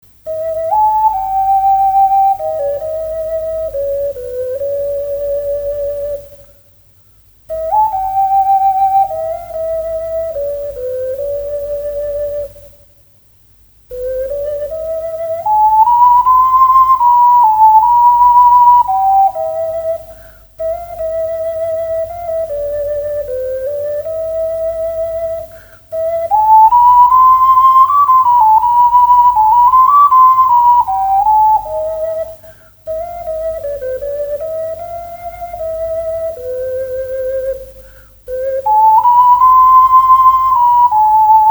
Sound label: Ocarina